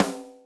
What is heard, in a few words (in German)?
snare drum fett klingen lassen snare